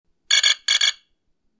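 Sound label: notifier too loud, blackberry bold 9700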